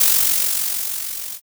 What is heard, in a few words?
sparkler